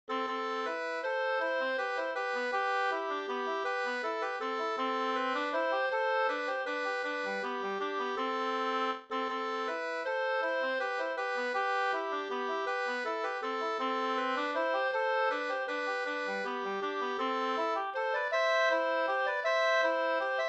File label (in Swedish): vals Amoll